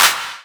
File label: Claps; Vengeance - Essential Clubsounds Vol.5